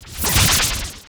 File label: Bleeding; Pc Skill; FlyForInsanity